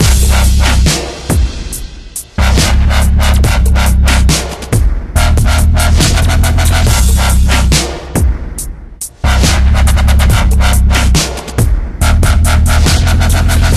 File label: Destroyed City Background